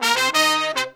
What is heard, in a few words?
96BPM, Horns; 90 s Sample CDs; Zero-G; Phantom Horns